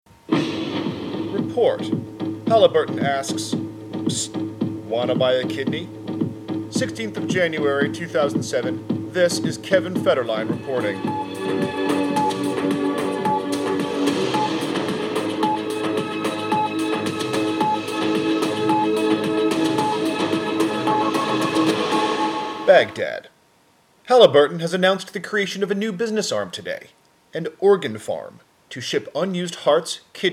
Organs

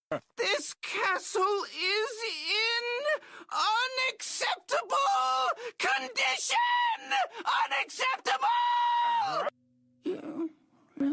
scream; Lemongrab

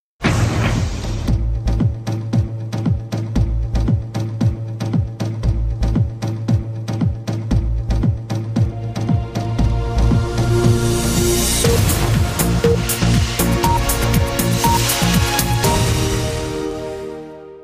New UnNews Jingle

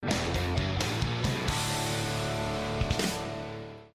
Guitar; That 70s Show

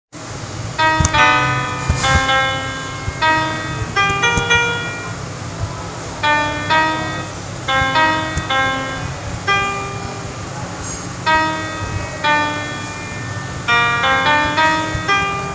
old classical song part 2

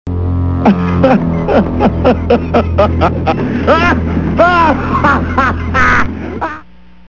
Maniac laugh; frasier

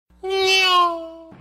Neeeow

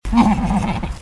"Mhhhh"

